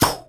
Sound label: Primal Shoot